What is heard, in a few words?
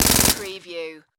Submachine Gun Uzi 9mm Short Burst Close Perspective